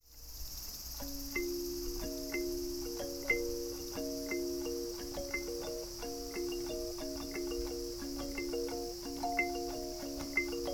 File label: cicadas